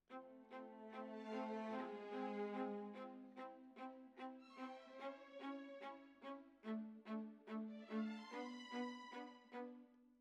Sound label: Violas, jazz for piano